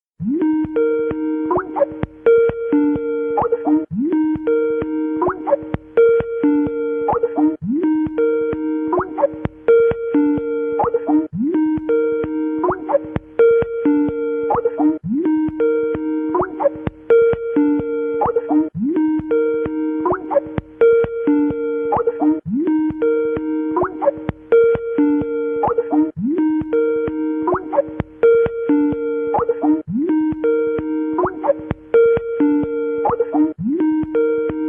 skype sonnerie appel

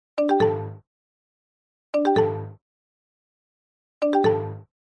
SONNERIE MSN

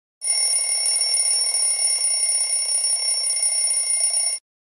sonnerie de reveil

